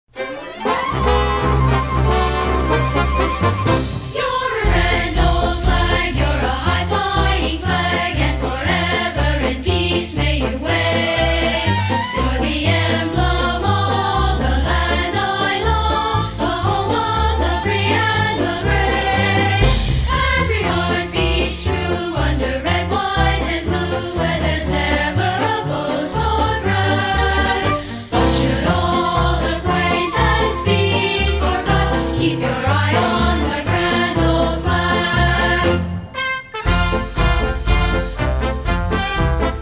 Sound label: vocal